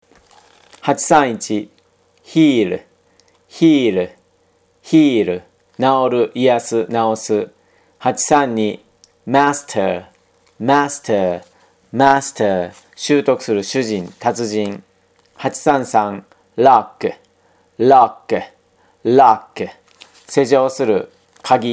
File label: tango